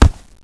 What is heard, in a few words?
tile; valve